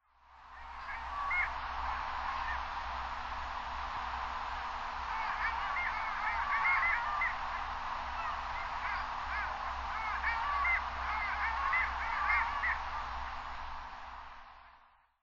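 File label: Long-tailed Duck, Wakkanai; コオリガモ; 北海道稚内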